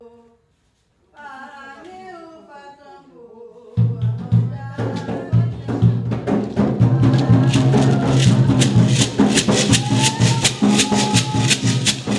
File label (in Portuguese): rufar tambor